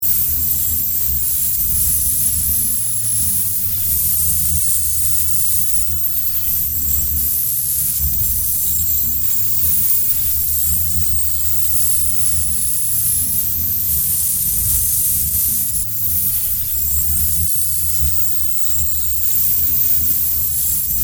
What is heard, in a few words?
Electric Magnetic, Scifi, Electric, Magnetic, Field, Discharge, Complex, Science, Fiction